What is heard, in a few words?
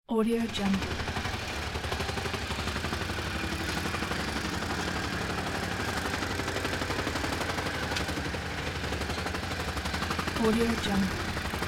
construction site pneumatic drill truck clinks; دستگاه حفاری برای ساخت و ساز; industrial sounds